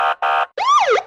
police siren brr brr

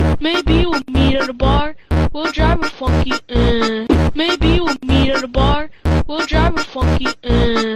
funky ehh phonk

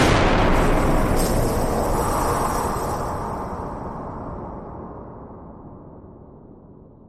horror scare

